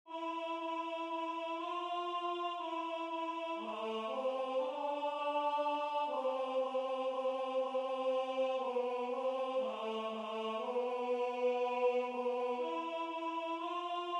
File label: Alto